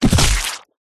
impacts body hit baseball bat hard whack crack squelchy bloody